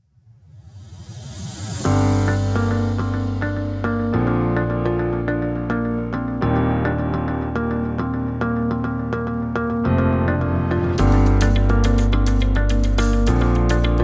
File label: Calm Corporate Confidence